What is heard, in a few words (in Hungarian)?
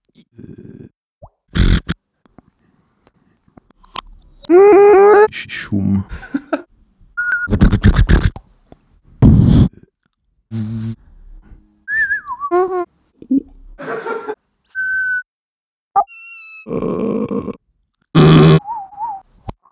Vokal